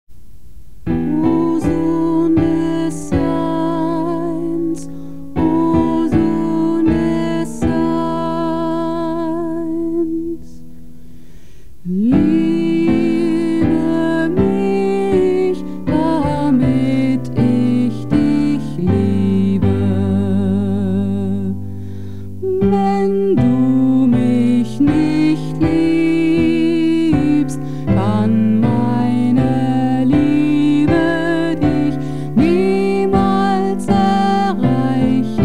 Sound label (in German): bass